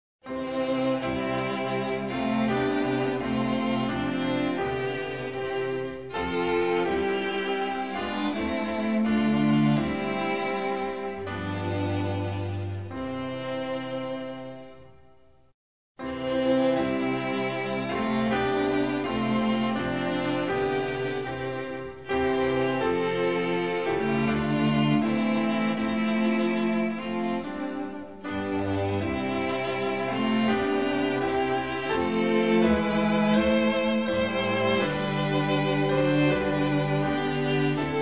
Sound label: when faith endures instrumental; hymns portuguese